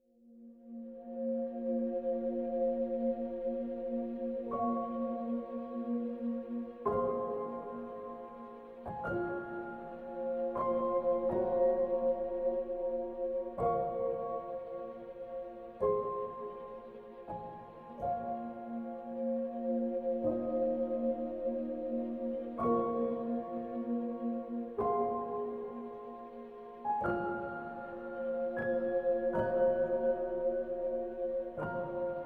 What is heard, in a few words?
snow